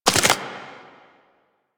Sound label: Proto Infested Maggot Step; Warframe